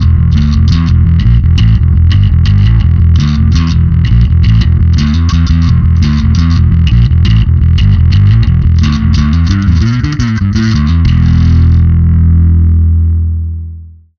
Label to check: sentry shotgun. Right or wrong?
Wrong